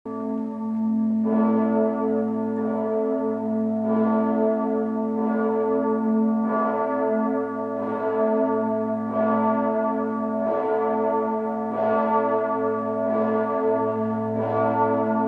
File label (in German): Glocke; dom st martin rottenburg